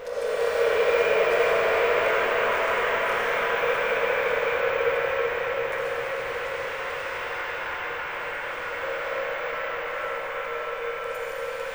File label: springdrum; Sonic Foundry (Sony Creative Software); Ugly Remnants VOL-2; UR 2 FX (Long)